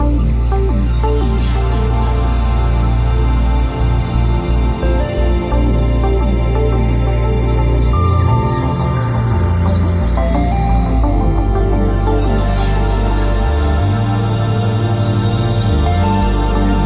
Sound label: Redforest Ambient Altloop Nodrums; Epitasis